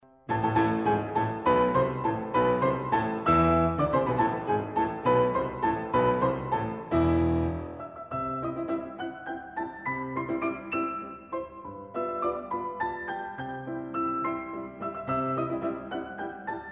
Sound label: German Dances; Franz Schubert